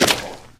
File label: paint, footsteps